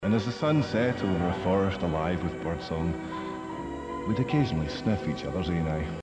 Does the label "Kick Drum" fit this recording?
No